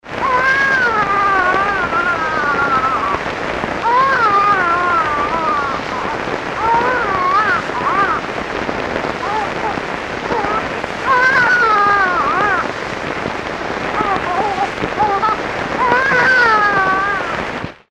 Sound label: Original Baby Crying